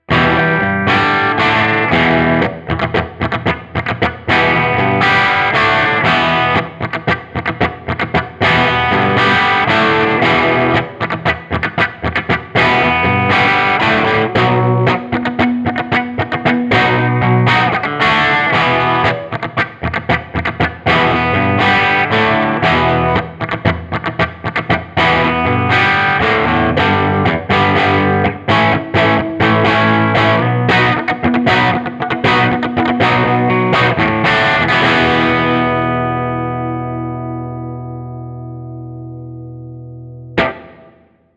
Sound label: Guild SFIII HiWatt; 2016 Guild Newark Street Starfire III